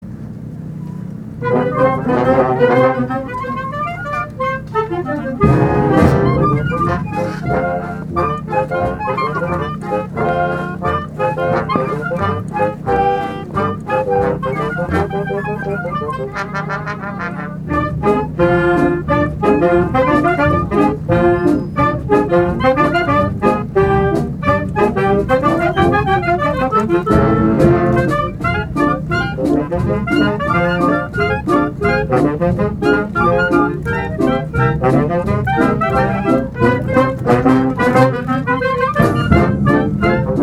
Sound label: JAZZ PIZZICATO